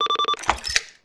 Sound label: keypad activate